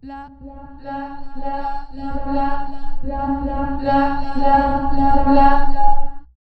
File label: drumloop; Example2 la drumloopB